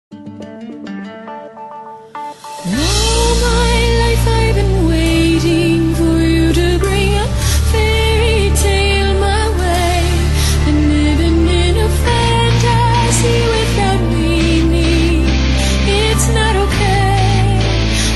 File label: AMBIANCE